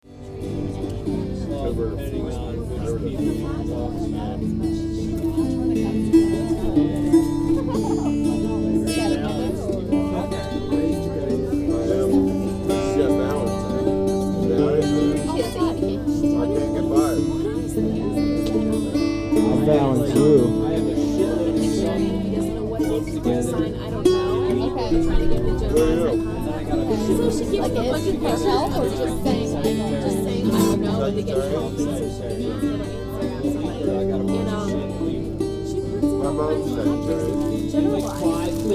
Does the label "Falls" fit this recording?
No